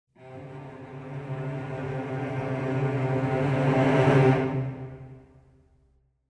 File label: Celli Trill Whole Tone; Audio Imperia Areia